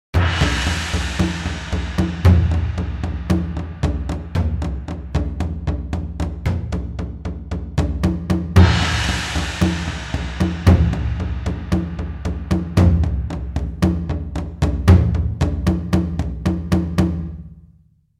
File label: ominous drum sound effect